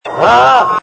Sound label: Ugh; spidey 2